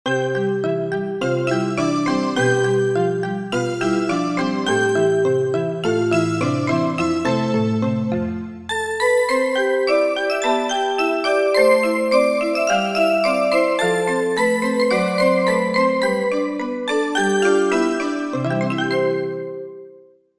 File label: Mallet Bell, Korg MSC-1S; Korg MSC-1S Variety